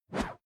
Whoosh Swish Sweep Long Plastic Pipe High Whistle Swip; Staff And Rod; Tom Hutchings